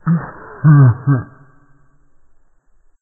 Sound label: Laugh